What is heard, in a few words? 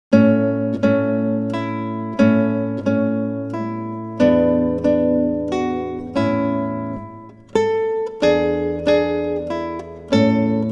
andantino, guitar